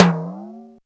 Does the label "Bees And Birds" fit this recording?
No